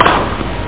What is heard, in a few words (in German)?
aufschlag